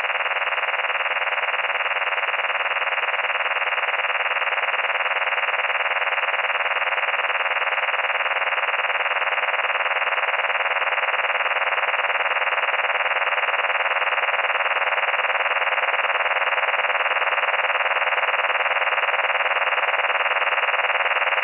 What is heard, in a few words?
ofdm-51 9375hz